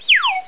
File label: whistle down; spongebob; super-easy-fun-adventure